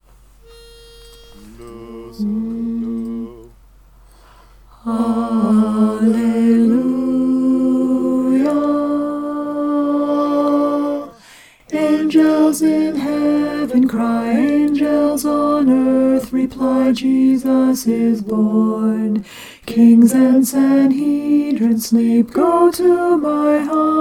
alleluia jesus is born alto

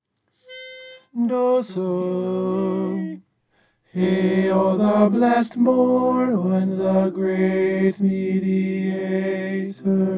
hail the blest morn tenor

